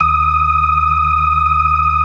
BLOOD OCT, Synth and Organ

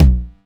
909 TOMS; Wizoo - Platinum 24 Electronic; 909 TOMS P2

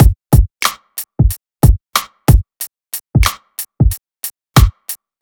BEAT, Hip-Hop & R&B; USB Soundscan vol.47; AKAI; HIHHOP

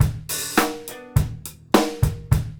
GROOVE

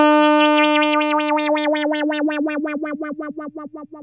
2600 D4, ARP 2600; Hollywood Edge - Giorgio Moroder Rare Synthesizer Collection

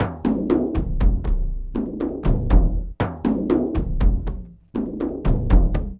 Frame - Kendang Space; Sonic Foundry (Sony Creative Software) - Friendly Fire; Drums; Long

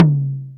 Drumtrax Tom, Sequential Drumtrax drums

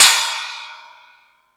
Thundadome, E-MU Pro-Cussion

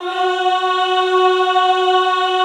EHH F#3, Classical Choir; Best Service

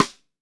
METAL, RIM SHOT

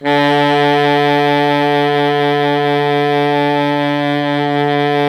saxophone alto, saxophones; Roland - LA Composer vol. 1

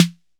SNAP SNARE; RAP CITY 1

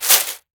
Household Shaker, household rhythm samples Hits

